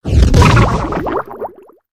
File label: Duck Hit; destructibles